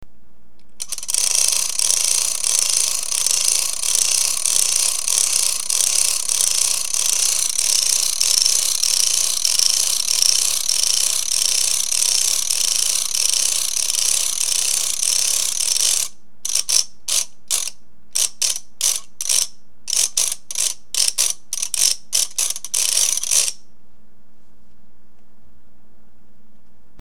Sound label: Ratchet